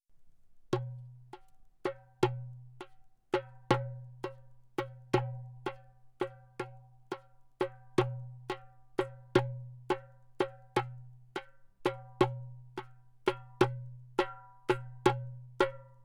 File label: darbuka anechoic dum tek tek, percussion